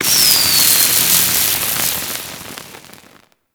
Firework Launch; Warframe; Fireworks

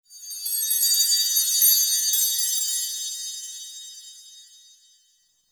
Chimes; Warframe; The New War; Narmer Outpost

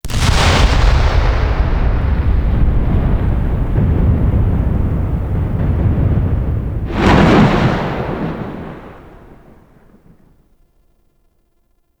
Zariman Void Storm Explosion; Warframe; The New War; Ambience

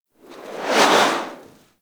Grenade Projectile By; Grineer

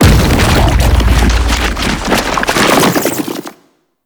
Octopede Lash Limbs Slam, Enemies; Warframe; ManInTheWall

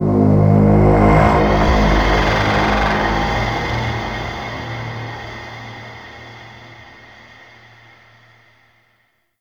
Devourer Reveal; Mission Three; Warframe; The New War

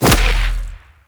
Edge Kick; Warframe; Pacifist

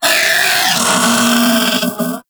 Vocal Death, Infested; Splitter Split Top; Grey Strain

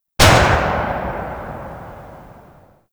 Double Barrel Shotgun Tail; Warframe; Weapons; Tenno

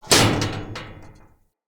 Pipe Hatch Close; Encounters; Ode Pollution Tower

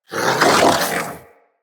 Vocal Alerted, Hunting Critters; Characters; Predasite

